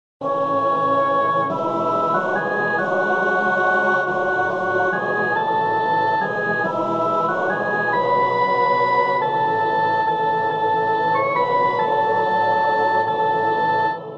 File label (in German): keyb; psalm 69